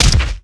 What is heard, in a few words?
swipe hit; weapons